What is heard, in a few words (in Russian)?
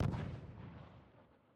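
глухой короткий дальний звук выстрела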